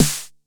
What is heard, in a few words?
Snare 909; gate experiments